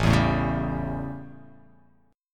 A 7th, chord; strummed; JGuitar

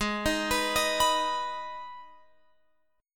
Ab Minor Ab down Guitar Open F; arpeggiated

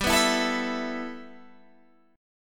G 6th Add 9th G Guitar Open G, chord

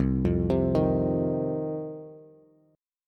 C Minor Double Flat 5th C down Bass; Cmbb5 arpeggiated; JGuitar

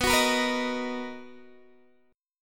B Major 7th B down Guitar DADGAD

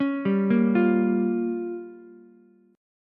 G Minor 7th Flat 5th, Ukulele; Gm7b5 arpeggiated; JGuitar

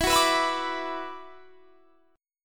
E 7th Suspended 2nd, down; E7sus2; strummed

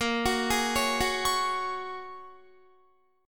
Bb Minor 7th Sharp 5th, Guitar; Bbm7#5 arpeggiated

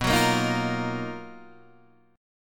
B Major Sharp 11th, Guitar, Standard; 7,9,8,8,6,6; strummed; capo=6

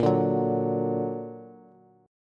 Bb Augmented Bb Bass; strummed; JGuitar

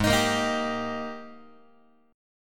Ab Major 7th Suspended 2nd Ab down Guitar; strummed; JGuitar

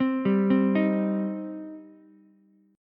G Suspended 4th Sharp 5th, Ukulele; Gsus4#5 arpeggiated; JGuitar

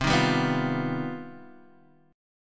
C Minor Major 11th C; CmM11 Chord {X 3 1 0 0 1}; strummed

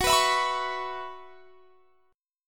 Gb 7th Suspended 4th Gb down, chord; Gb7sus4 strummed

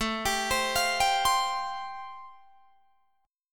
A Minor 7th Sharp 5th A, Guitar, DADGAD; arpeggiated; Am7#5

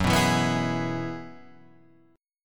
Ab Minor 7th Sharp 5th

